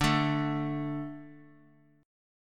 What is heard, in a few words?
D 5th D, down; D5 strummed; JGuitar